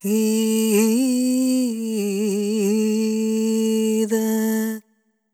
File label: ETHNO FEMALE, Vocals; ethno2